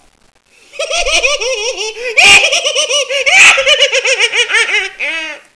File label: laugh